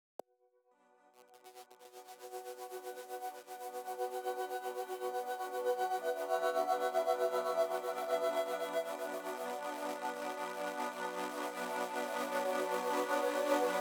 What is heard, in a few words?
Pads 156; Aurora Borealis